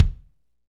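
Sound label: kick funk, Roland; Northstar - Drumscapes Roland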